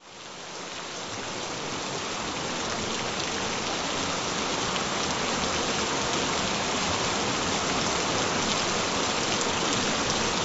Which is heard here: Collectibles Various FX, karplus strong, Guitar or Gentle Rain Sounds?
Gentle Rain Sounds